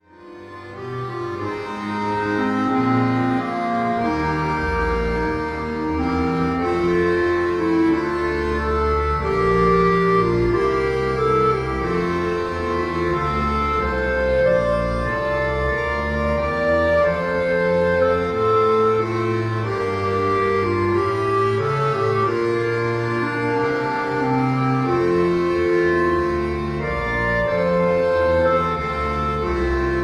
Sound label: Auld Lang Syne Trio Computer Sample